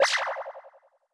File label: Use item; The Denpa Men; Android